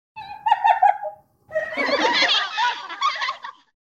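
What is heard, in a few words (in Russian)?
ведьмочки хохотушки смеются над кем то; Для Хэллоуина; звук смеха ведьмы; Коварный злой смех старой ведьмы, забавный смех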